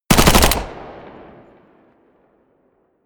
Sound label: smg uzi 45 close burst